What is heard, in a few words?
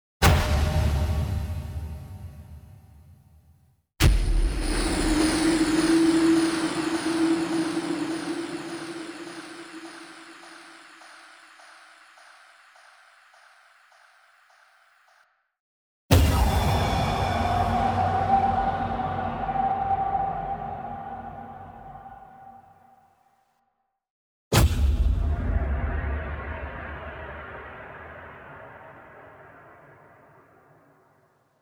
Impacts